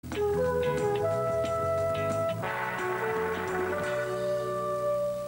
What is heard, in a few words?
ringtone; aktuelle kamera